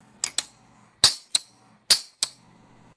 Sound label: repeated metallic gas grill starter clicks